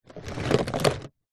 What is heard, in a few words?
office felt tip pens pencils other stationery tip side plastic tub